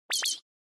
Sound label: Surprise High; Onoma